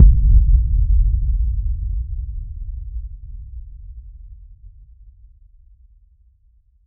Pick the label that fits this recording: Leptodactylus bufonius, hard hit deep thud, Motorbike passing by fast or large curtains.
hard hit deep thud